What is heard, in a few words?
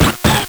LSDj, Nintendo Gameboy; 300 Drum Machines